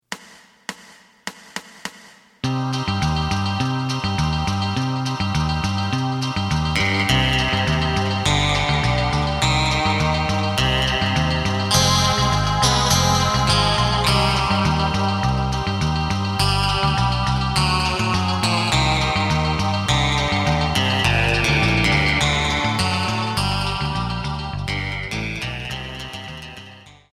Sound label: drum